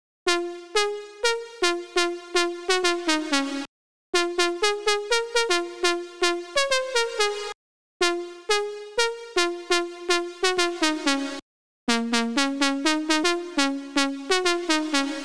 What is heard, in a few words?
124 BPM A# Min Lead; Drop Loops; House; Cymatics; Reasons